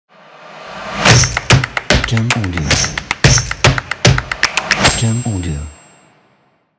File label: Stomps Claps Ident